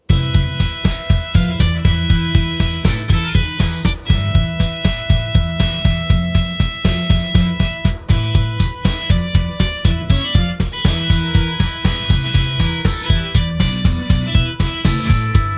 quirky fantasy music, loopable

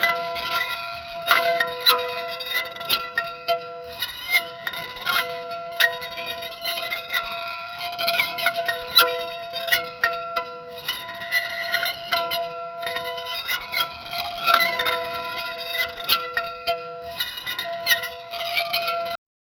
old rusty metal disc spin; dark

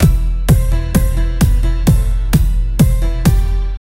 short rhythmic but clean, kicks

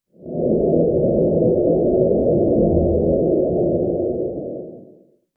the deep space sound of

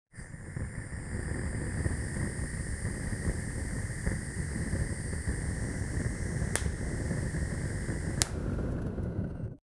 burning fire torch

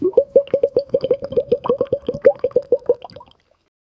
muffled plea water slosh; throb

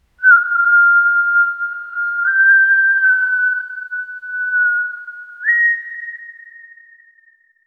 slow haunting human whist; empty space

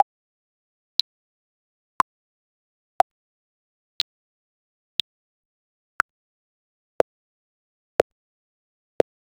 short ui tap, melodic

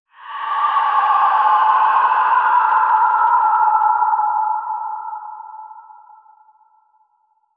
a faint distant ghostly, digital

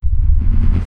the sound of hollow infin, fighting game

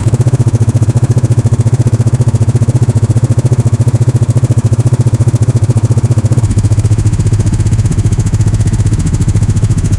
harley davidson starting, motorbike

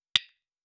crack of glass like, battle; crack of glass like white

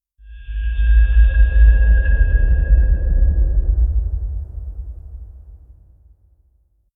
a descending shell produc, air rush

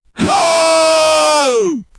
human battle cry before c, charge

